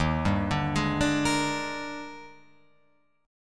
Eb Major 11th, Guitar, Open F; EbM11; arpeggiated; JGuitar

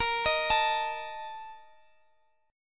Bb Major 7th Suspended 4th; arpeggiated; BbM7sus4; x,x,x,15,16,17

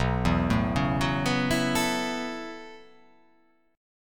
B 9th Suspended 4th B down

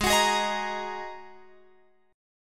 G sharp 7th Suspended 2nd G sharp Guitar Open F; G#7sus2; x,15,18,15,18,17; strummed

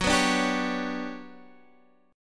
G Major Sharp 11th F sharp, chord

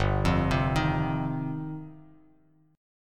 G 7th Suspended 4th Sharp 5th, Bass Standard; G7sus4#5 arpeggiated

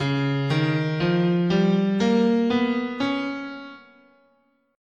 C sharp Prometheus, guitar, scale

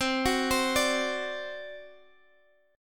C Suspended 2nd Flat 5th C Guitar DADGAD; arpeggiated; Csus2b5; 22,21,22,19,x,x

